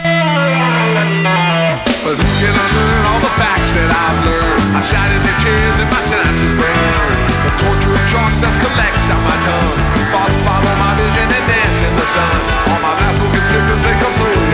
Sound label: muzak; Games World; New Game Levels; Duke Nukem; Epic